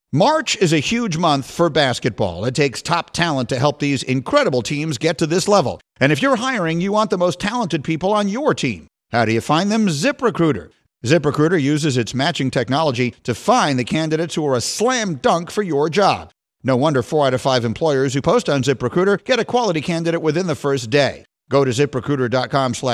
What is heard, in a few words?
ZIP MARCH